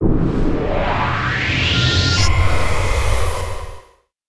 Flame attack; Seer; GamigoNA

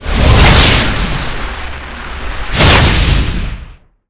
Spirit Fire Skill; Seer; GamigoNA

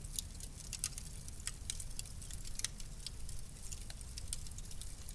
Fire

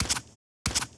Turkey Walk

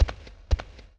Goblin Walk

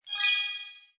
Mover Upgrade Start; Seer; GamigoNA

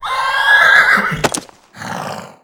cyber horse action

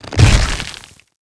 legendary tree die

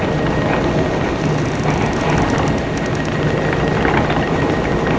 flying boat

